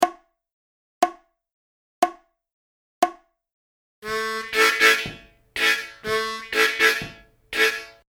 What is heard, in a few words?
12 Bar Country Style Blues Lick 60BPM, harmonica